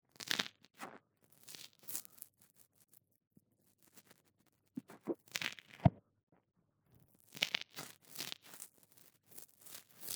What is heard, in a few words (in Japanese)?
耳かき左, ASMR